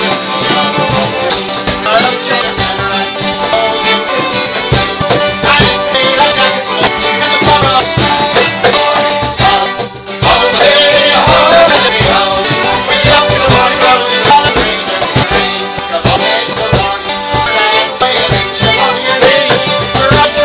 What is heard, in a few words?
folk night at the lamb